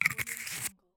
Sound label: dirty glass bottle cap screw; stormwaveaudio